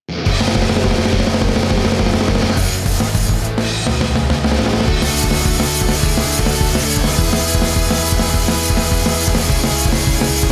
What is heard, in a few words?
off vocal